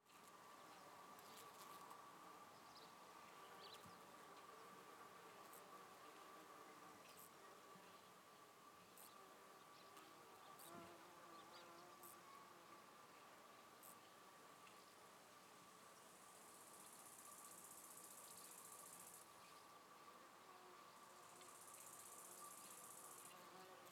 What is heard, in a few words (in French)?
Friche Industrielle Pépillement Grillon Abeille; Rhinau